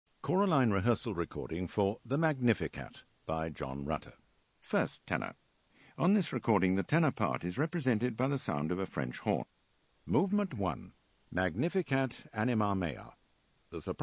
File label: Tenor; Rutter Magnificat